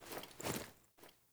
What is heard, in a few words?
Foley Action Arm Parry Riposte, Default Clothing; Blight; Player